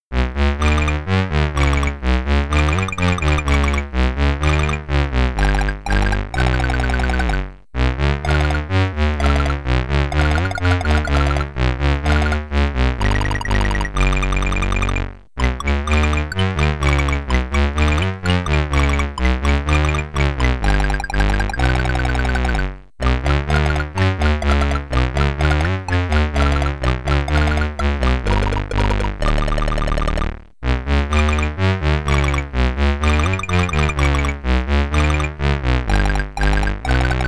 Dracula (SID Stereo), commodore 64; Castle of Terror

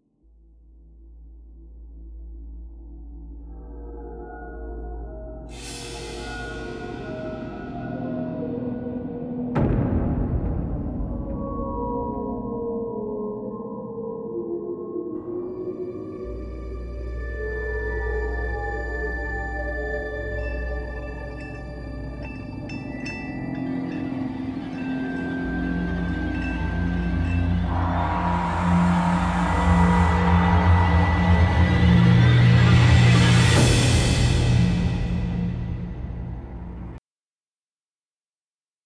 Cut Scene Music

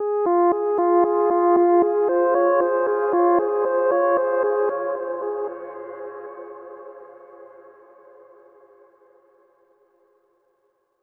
Overlay Tone; The Shard

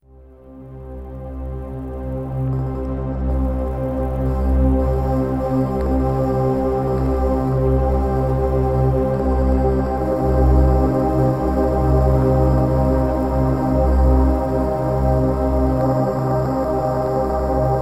Stinger Exploration Drone

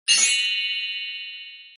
Sfx Achievement Unlocked

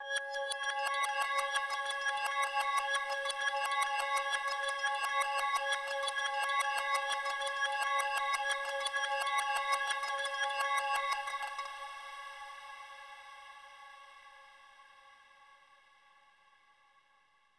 Overlay Tone; The Shard

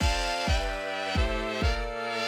Main Menu Intro

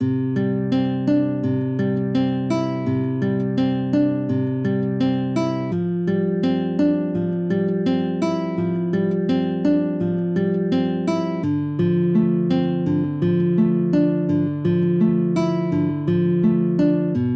bgm game guitar

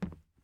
plastic step

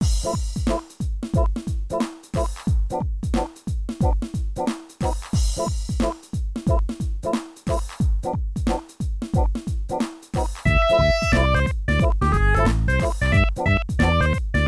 Stage BGM; Ghoul Panic; Namco System 12

